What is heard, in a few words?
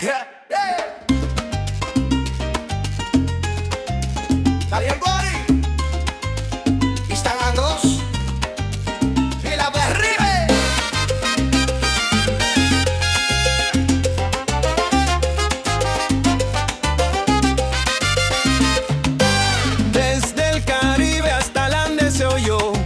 SALSA